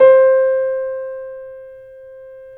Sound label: C7; East West; The Ultimate Piano Collection